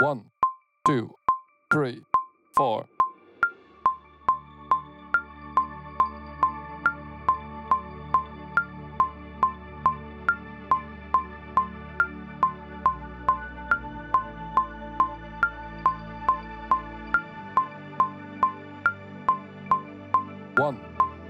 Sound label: Agnus Dei Split 70bpm D